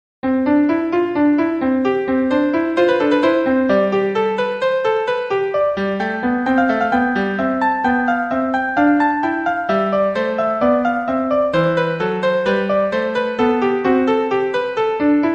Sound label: piano; BWV 772